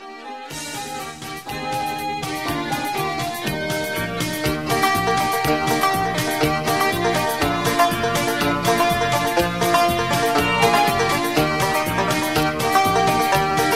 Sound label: Low Key